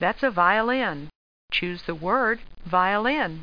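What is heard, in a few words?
violin; Tandy Sensation II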